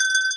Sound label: tint